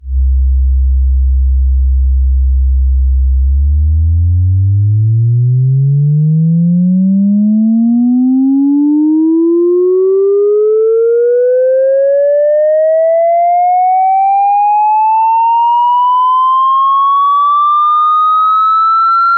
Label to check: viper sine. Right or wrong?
Right